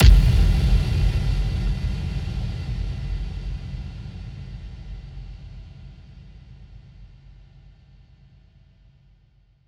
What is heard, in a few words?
electric explosion; Crash Bang Boom